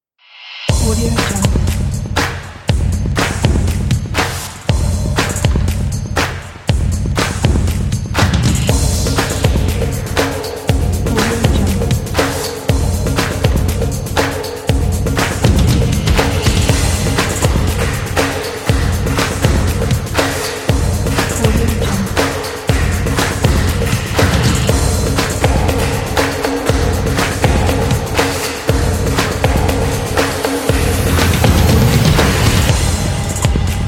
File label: Fashion Percussion Drums